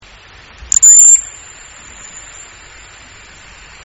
ping from SQS510, sonar; Ottawa

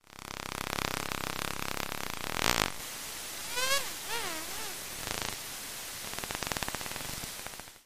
harbor porpoise, Salish Sea sounds; dosits

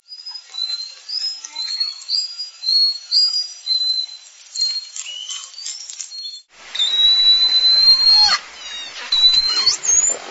whistle examples, SRKW

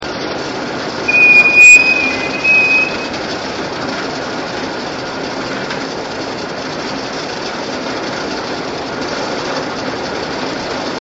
ferry squeaks elliott bay; anthropogenic; salish sea data examples; signals

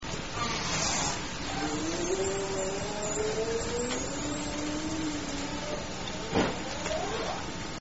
long yawn, orca; dabob transient calls

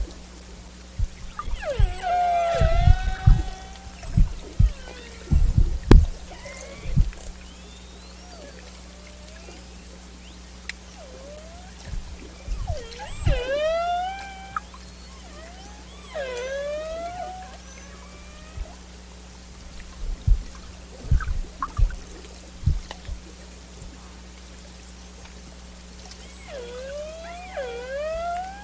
Biggs, biophony

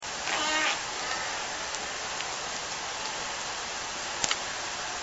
buzzes, orca call

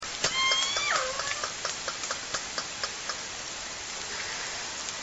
clicks

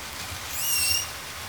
call, Orca; SRKW; Alwin

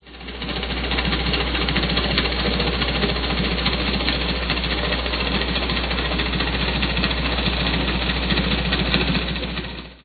fishing, Salish Sea sounds

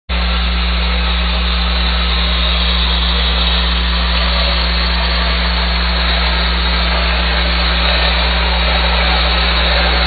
hum background powerboat

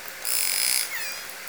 call, L pod, Orca; SRKW; Alwin

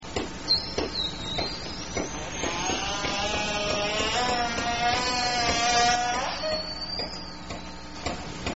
long buzz call many harmonics

